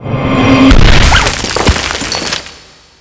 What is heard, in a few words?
attack